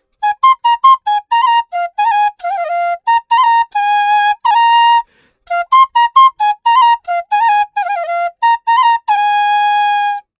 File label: Flute Loop G Sus 90 BPM, Assamese folk; Gully Loops; waves of bihu